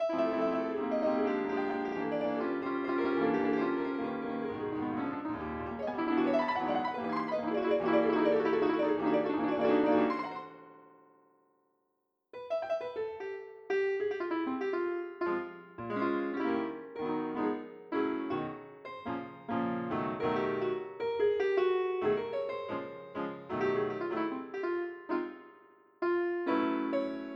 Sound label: piano